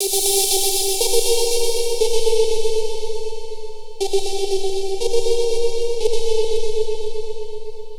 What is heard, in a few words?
Trance Synth